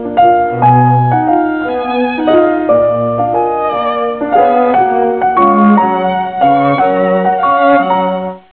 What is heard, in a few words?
Classical; Easy Writer 3.5